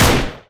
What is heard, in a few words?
battle rifle; Weapons; EK modern; Barotrauma